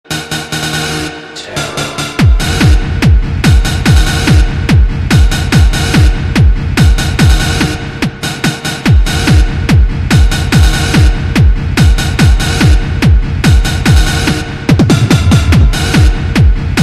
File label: hard style tone